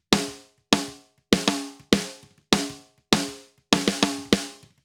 SNR OFF

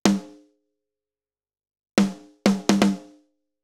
Snare Process Gate

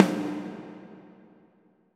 Reverb Insert

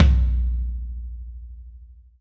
Floor Rack Stereo

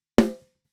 Pitch Process

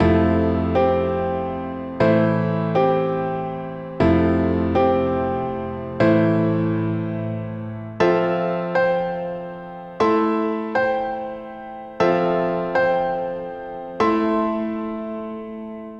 Piano Original

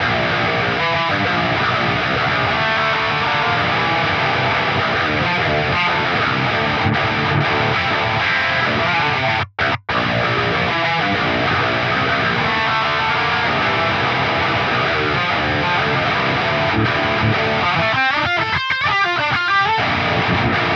Guitar smart EQ

